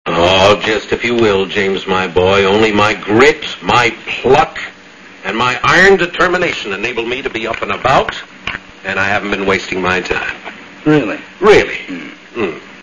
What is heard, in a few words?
pluck; THE NIGHT OF THE BIG BLACKMAIL